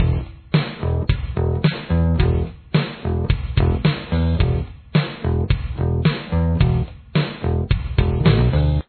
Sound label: bass